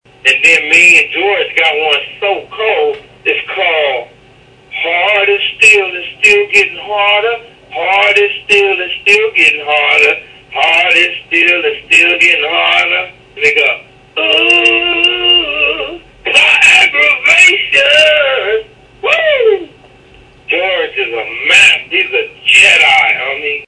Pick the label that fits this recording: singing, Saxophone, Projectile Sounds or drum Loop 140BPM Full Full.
singing